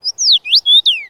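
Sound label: ambience Battle Village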